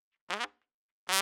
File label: Trump